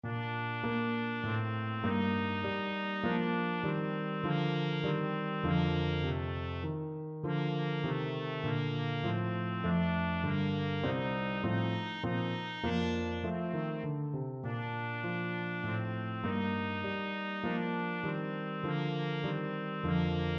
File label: trumpet